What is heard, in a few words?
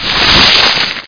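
bush crush; Software Explosion; Fore-Matt Home Computing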